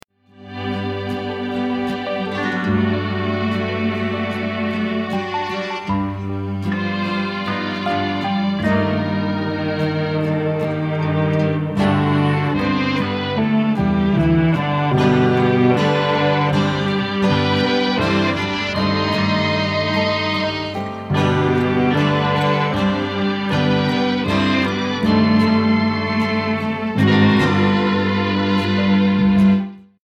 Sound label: mellotron